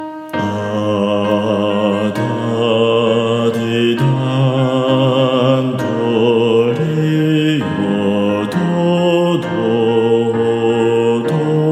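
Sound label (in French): basses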